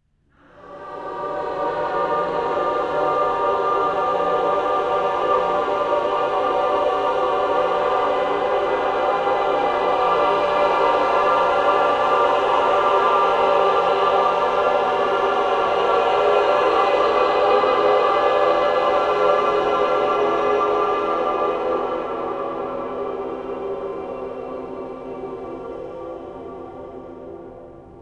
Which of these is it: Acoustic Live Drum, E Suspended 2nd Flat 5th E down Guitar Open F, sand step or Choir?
Choir